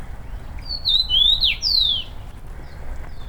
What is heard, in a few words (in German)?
Karmingimpel; Murnau; Christian Haass